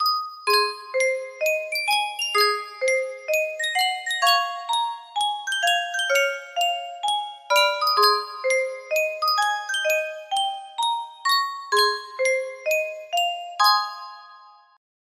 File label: yunsheng custom tune music box